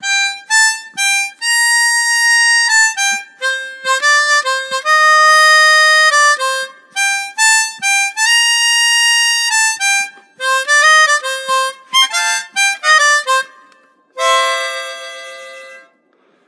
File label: Hohner Toots Mellow Tone